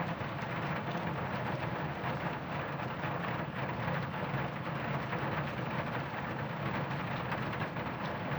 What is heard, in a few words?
Rain Roof; zps; Ambient